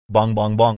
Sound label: bong bong bong